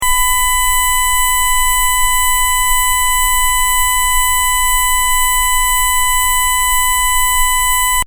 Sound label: low tone; Transducers USA